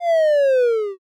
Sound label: picosleepy